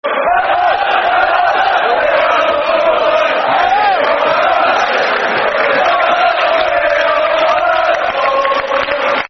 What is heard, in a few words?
Hello Hello We Are The Portsmouth Boys, Football Chants (Soccer Songs)